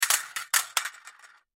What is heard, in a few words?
Curtain Rod Drop Aluminum; Windows; Household; foley; Blastwave